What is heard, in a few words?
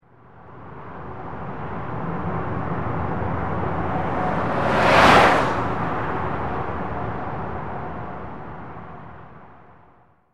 Highway car passing by; vehicle